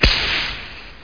Firewrk; Buggy; PS1

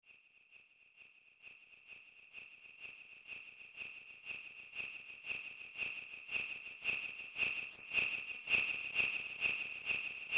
Jingle Bells; Tengai Makyo Zero; Far East of Eden Zero; SNES

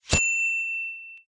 SFX Ding

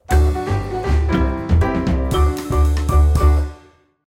cleared; Sprinkle Islands

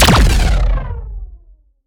Parry Large Blaster Distorted Cruncher

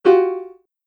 Rhythm Whoops; super crazy rhythm castle

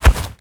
Fishmen Footsteps Dirt Run; we are the dwarves gamerip 2016

